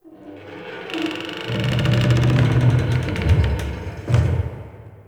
Wooden Creak; Warframe; Ambience; Nineteen Ninety Nine

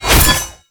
Bayonet Melee Combo; Warframe; Tenno

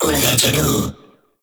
summon vocal; Warframe; Uriel; Vythelas

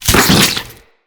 Venom Tick; Warframes; Saryn

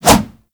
Melee Kick Whoosh; Warframe; weapons

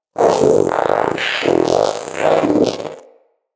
Companion Vocal Idle Growl, Pets; Zanuka

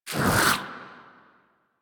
Contagion Cast; Warframe; Saryn

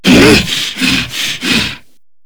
Grineer Hammer Boss Grunt A; Warframe

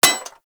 Bullet Impact Generic Metal; Warframe

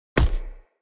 Decoy Bow Shot Punch; Powers; Fey; Saboteur

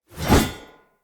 Great Sword Whoosh; Weapons; Player; Mendicant Great Sword

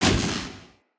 Orb Cannon Orb Destroy, Powers

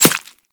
Arrow Impact Flesh; Warframe

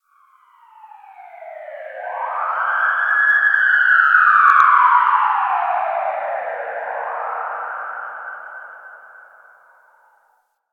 Tunnel Distant Siren; Warframe; Ambience; Nineteen Ninety Nine

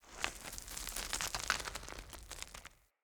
Cliff Rock Debris Small; Ambience; Midrath Island

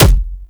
Explosion Punch Generic Medium; Explosion Punch Layers; Weapons

